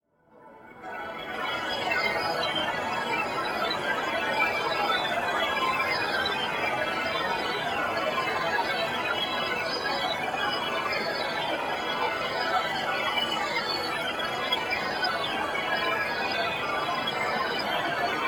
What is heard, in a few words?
madness